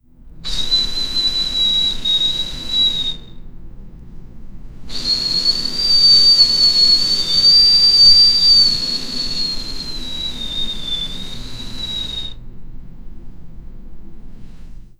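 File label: air movement swishing swoosh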